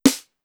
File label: Snare, Drum Machines, Clavia DDrum AT, Pitched Up